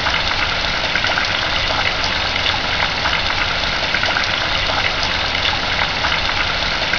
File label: water; 3000miles